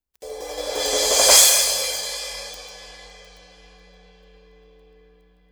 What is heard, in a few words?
sabian 14 aax studio crash